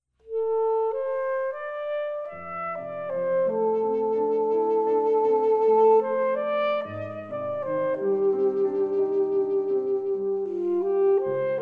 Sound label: Reed